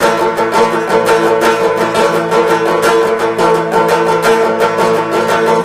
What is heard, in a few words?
banjo; Left 4 Dead 2; Source Engine; video games; zombat; danger